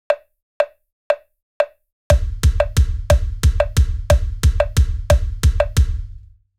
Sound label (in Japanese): 2拍3連